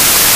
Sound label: Static